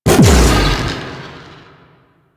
Bite Cast Burst; Warframe; Warframes; Voruna

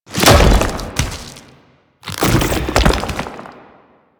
Arm Entry; Warframe; Man In The Wall

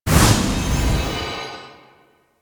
Swarm Scanner Completed Start; Warframe; Entrati

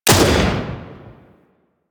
Grenade Launcher Shot; Grineer

